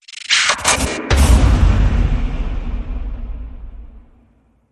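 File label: Hit Sttr, stutter hit; Primary Igniter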